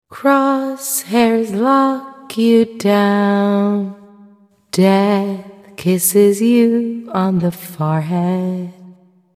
crosshairs lock; [ML] Lesley

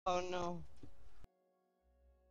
owww

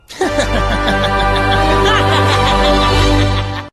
laugh; sonic

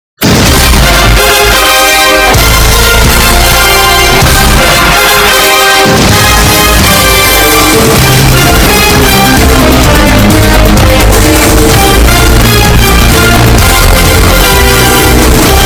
baseball intro loud

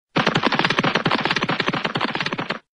cartoon running sound effect

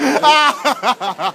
hahaha

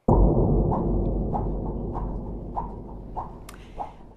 suspense

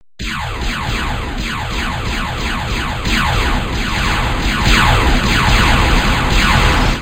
laser

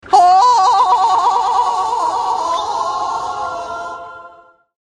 nautical laugh

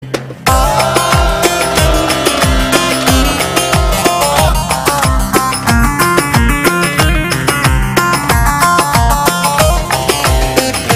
twinkle music

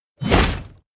shield

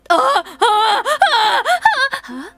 polka

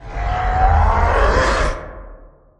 switch; torak; Hulk Change

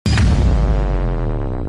quick boooom

